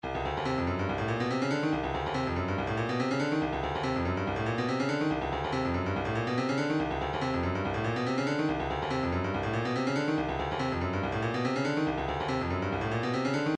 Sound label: 142 tambourine, midi